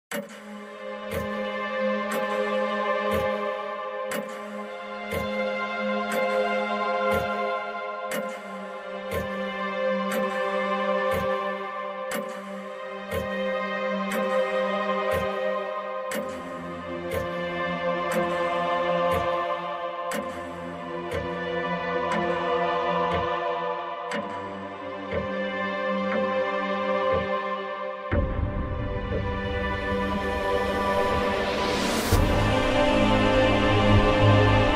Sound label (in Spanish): musica de terror para creepypastas; tu tiempo se acabo